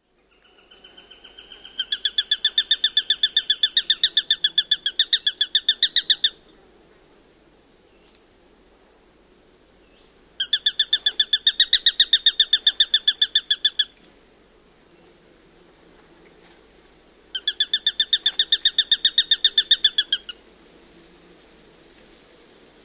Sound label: eurasian sparrowhawk sound